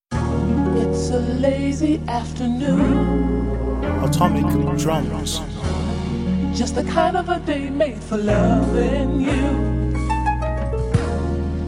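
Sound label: drums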